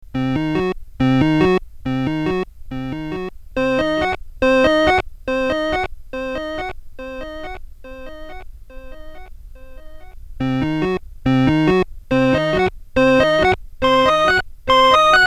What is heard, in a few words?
1sec delay Orgel; delay time; loop time; All recordings are direct line - instrument + OCTO-FX + audio interface = mp3-file; Organ